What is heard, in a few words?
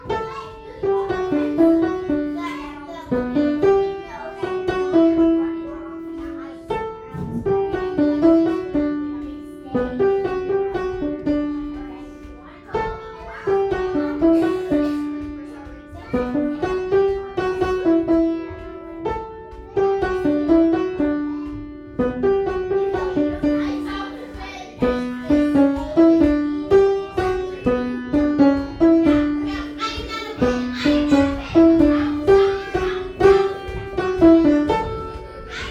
waltz